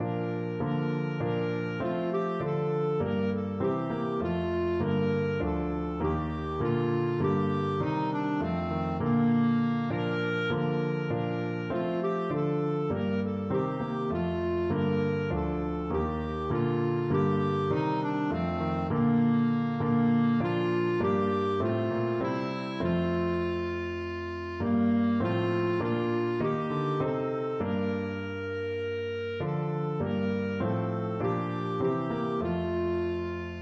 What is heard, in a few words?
ein feste burg, clarinet